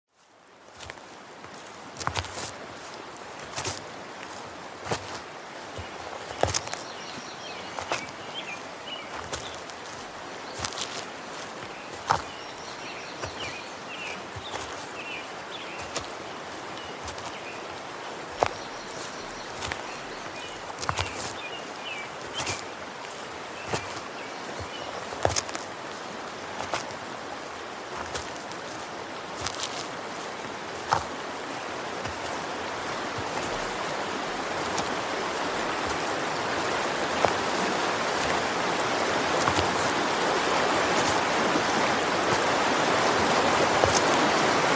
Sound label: Hiking in nature